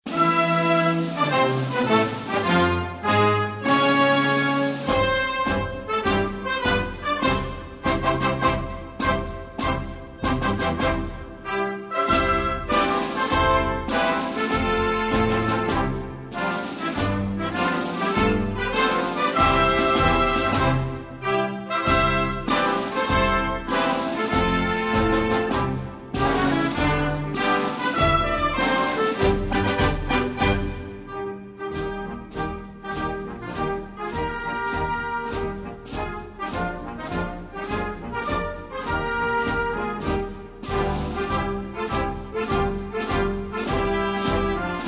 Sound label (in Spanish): Himno Nacional de Bolivia instrumental